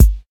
rich top end kick sound g sharp key, Royality free kick single hit tuned to the G# note